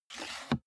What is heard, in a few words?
car bmw e46 interior sun visor mirror slide soft